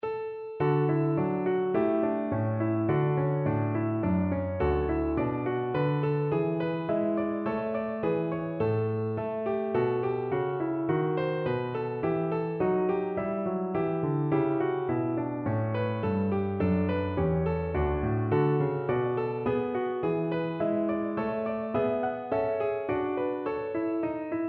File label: inventio PNO, piano